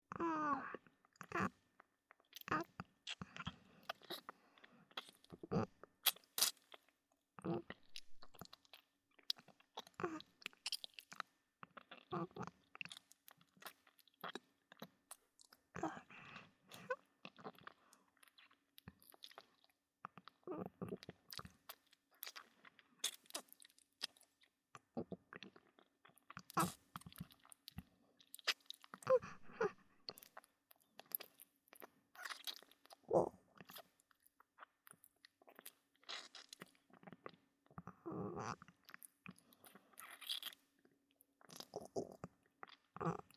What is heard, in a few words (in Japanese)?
ディープキス音; ASMR